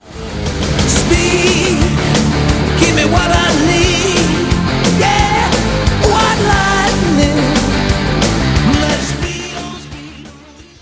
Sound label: win, music